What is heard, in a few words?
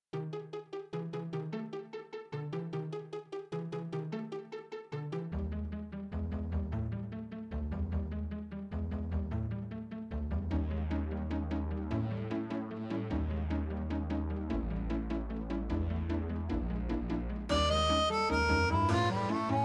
concertino solo harmonica